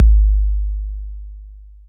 BD 808 Smooth

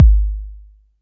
BD

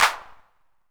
Clap 808 Color, Hand Clap

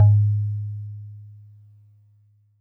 Warble Celeste DX100 G#-1

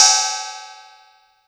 Cymbal; TRX

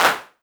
Clap; EFM

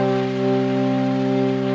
Chordal Reef Trumpet C3

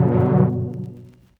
MicroFreak Diving Vinyl Synths Cmaj7 G1; vinyl chords

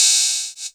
Hihat open

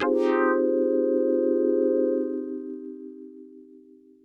Synth, Splash Wah

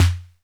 SD 808 Pitched

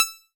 Old Banjo DX100 E6

